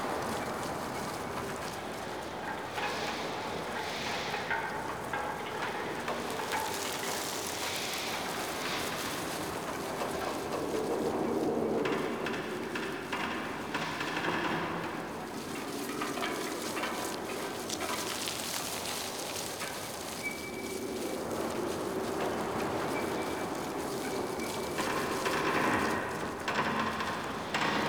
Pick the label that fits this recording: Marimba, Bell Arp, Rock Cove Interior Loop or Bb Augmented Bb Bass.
Rock Cove Interior Loop